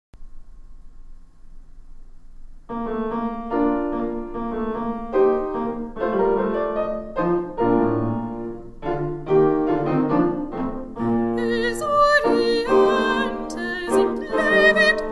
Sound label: Soprano; Esurientes